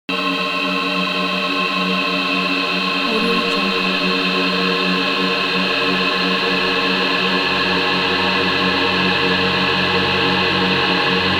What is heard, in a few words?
atmo pads vibrating metal